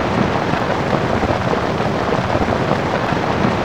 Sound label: Gravel Surface; Totaled; XBOX